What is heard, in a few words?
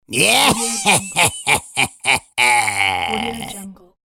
wicked sinister laugh